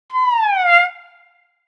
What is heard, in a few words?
Cartoon disappointment